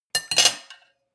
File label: cutlery on plate place; soundpool